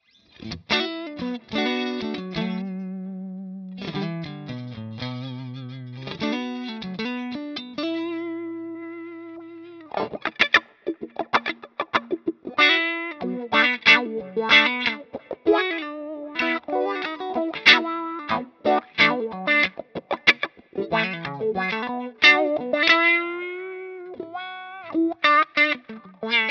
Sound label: Clean Single Note Lines